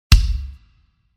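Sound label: Kick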